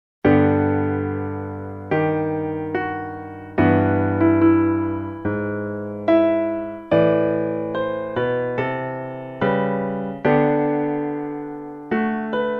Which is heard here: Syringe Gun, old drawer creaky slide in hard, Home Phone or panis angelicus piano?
panis angelicus piano